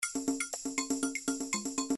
latin 1bar

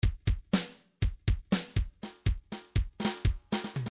midi fills rock

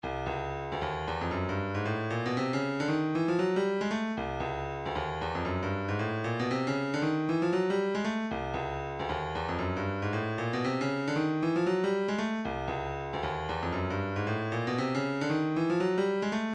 hihat

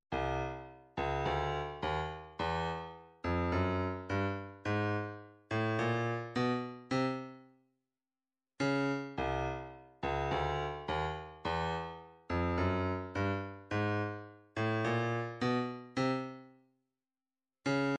130 hihat, midi

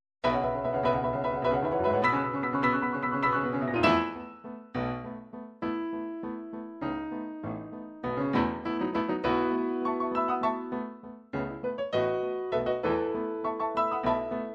piano

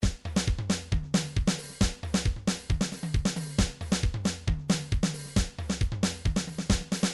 trance midi loops beats

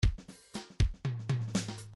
midi file fills funk fill

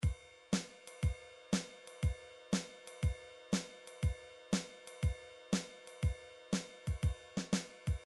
drums, midi drum patterns

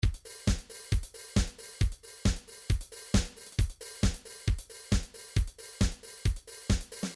trance midi loops beats classic; newtronic trance constuction kit

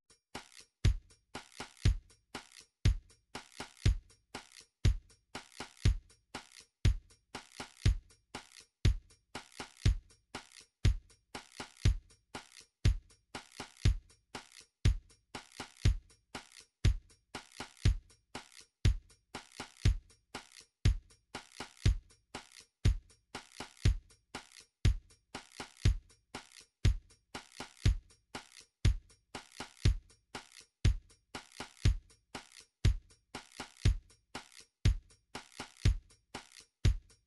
drums, midi drum patterns

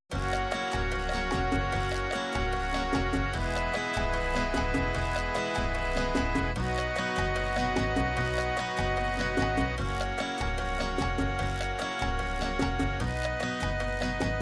cumbia, midi

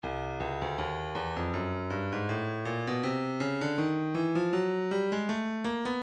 percussion midi files shakers 080 shaker; Stylus

